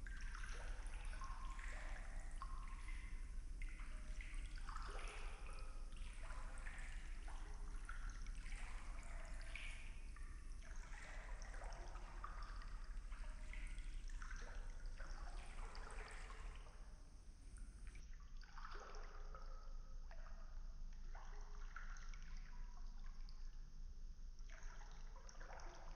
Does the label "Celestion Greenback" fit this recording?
No